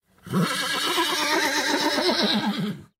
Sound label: Horse, ringtones; Huawei C8813D